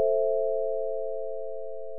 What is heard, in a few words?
Just A min